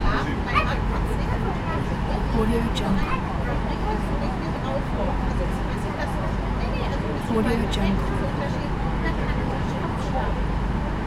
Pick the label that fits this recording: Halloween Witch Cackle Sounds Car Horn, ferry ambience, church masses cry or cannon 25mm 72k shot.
ferry ambience